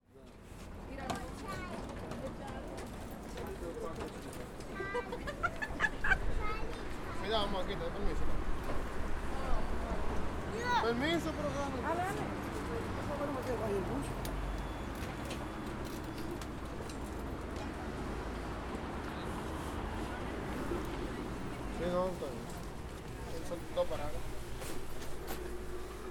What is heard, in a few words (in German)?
strassenbahn; tascam dr-44wl